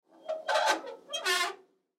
X-Ray Machine, X-Ray Machine Move On Track Short Squeak; Emergency; X Ray Machine (Sound Effects